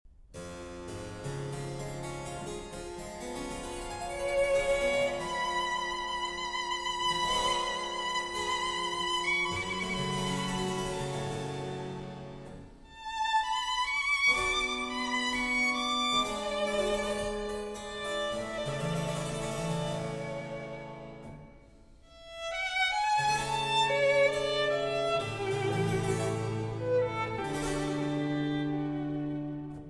Sonata in A for Violin and Continuo

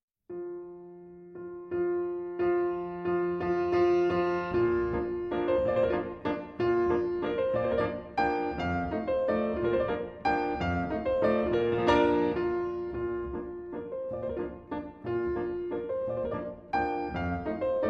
Mazurka In B Flat; Chopin; Mazurka no.56; (For Alexandra Wolowska)

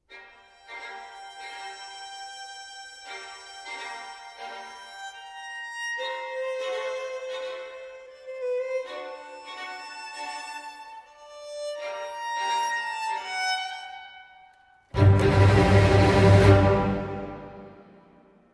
Violin Concerto in G Minor, Adagio - Presto; RV 315, L'Estate II.

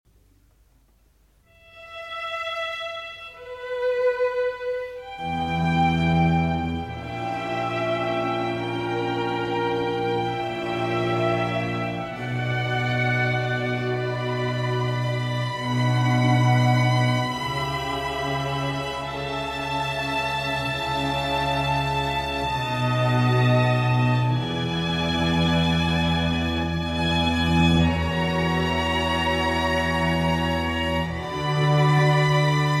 Concerto No. 4 in E Minor for 4 Violins

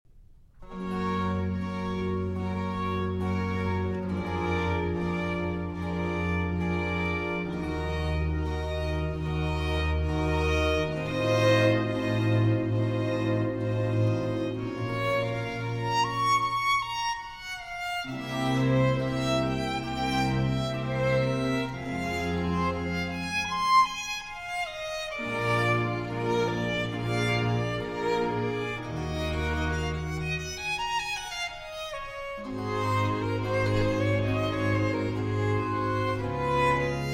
Violin Concertos, Concerto No. 7 in G Major

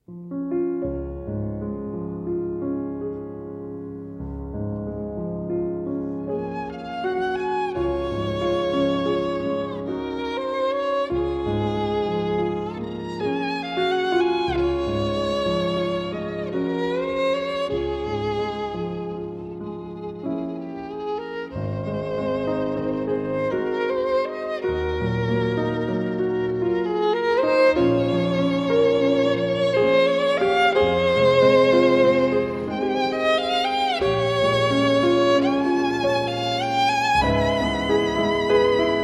Violin & Piano; Paganini Variation

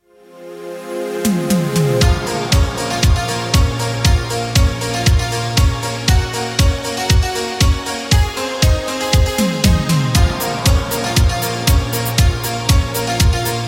Instrumental

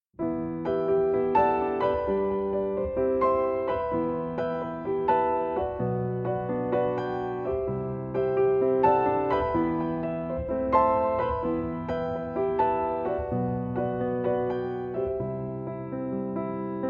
Piano Version

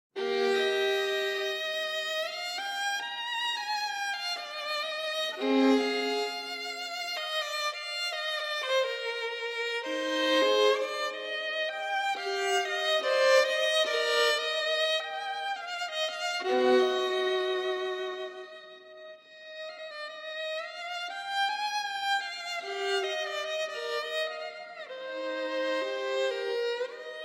Sonata For Solo Violin Grave; No. 2 I.

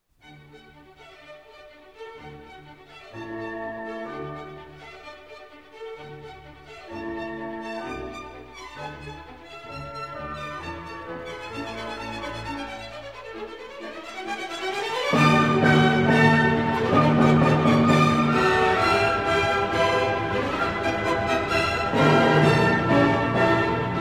Symphony No. 1 in D Major; Schubert Symphony No. 1 in D Major, D. 82 - IV. Allegro vivace

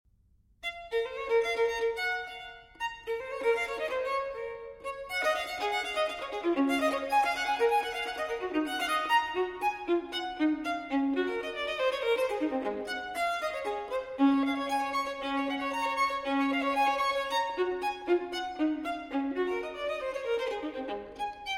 Fantasia For Solo Violin in B Minor, Vivace; TWV 4022; Telemann Fantasia

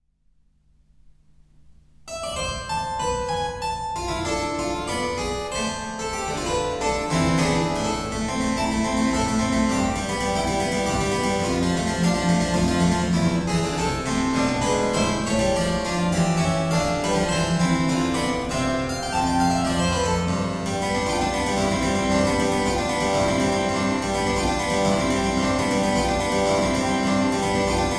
Keyboard Sonata in B Minor, Allegro

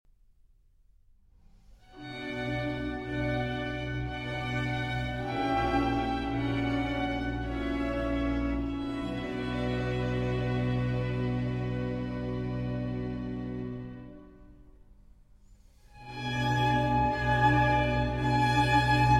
Concerto for Strings and Continuo in D major

